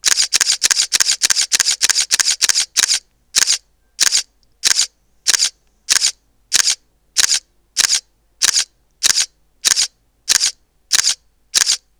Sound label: E-450 continuous, Olympus